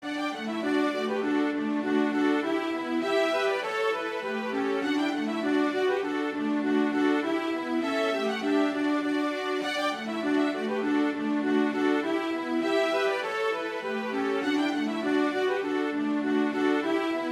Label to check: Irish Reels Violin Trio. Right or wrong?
Right